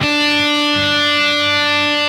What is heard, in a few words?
stroke, Guitar; Notes